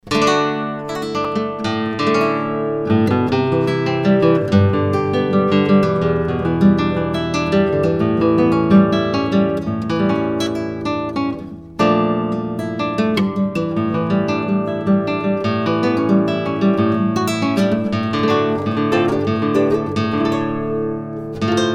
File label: nylon classical